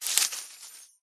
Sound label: fur tree attack voice up